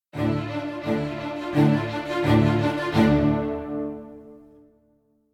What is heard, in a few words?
Combat Out; Novigrad